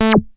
SHORT ACID, BASSES

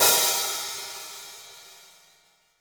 hihat - opened; JazzFunkKit

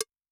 CowB; Yamaha DTXpress-II; Perc

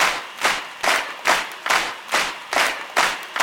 Crowd Effects; Vengeance - Essential Clubsounds Vol.4; 140BPM

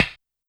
Snare Analog, YAMAHA RM1X

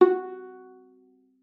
F#4, VIOLA PIZZ; Xsample VOL-5; 90 s Sample CDs

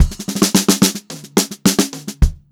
109 groovy fill 3, funk groove drumloops; USB Soundscan vol.38; AKAI

